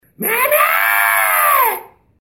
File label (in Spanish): velociraptor